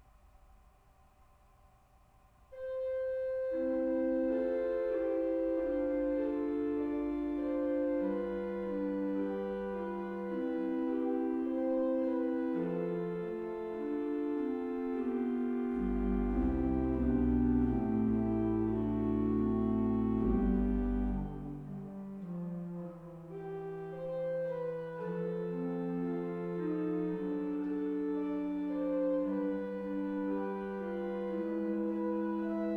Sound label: Con Moto in C, orgels; Diever; GJ Vogler